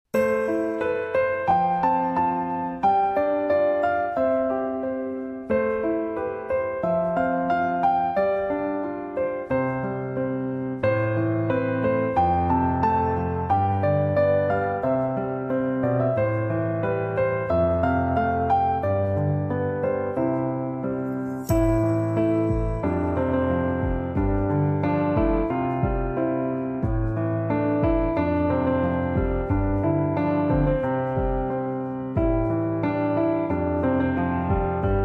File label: Piano